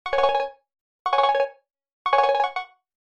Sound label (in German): Klangbeispiel Telefon Raum